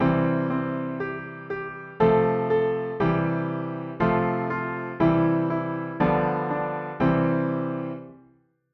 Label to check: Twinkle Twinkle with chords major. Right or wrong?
Right